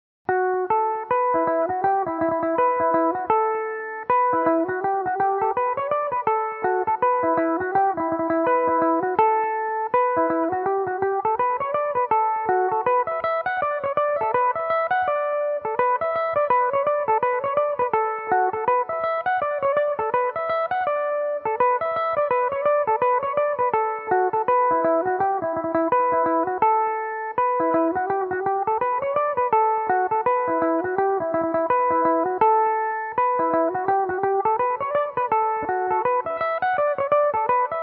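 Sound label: D major belmuse, irish mandolin; The Bicycle; Belmuse EM-190 electric mandolin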